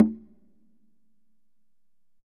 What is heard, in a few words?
CONGAS WET; Q Up Arts; Denny Jaeger Private Collection